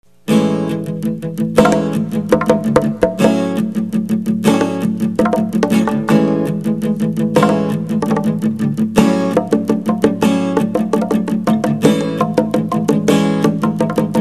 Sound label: trumpet; cultural skate arte vida